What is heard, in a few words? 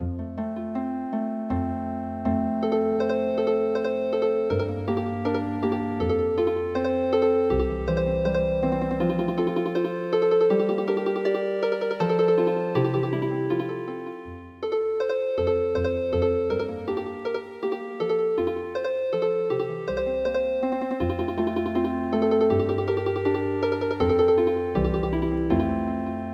Healing Harp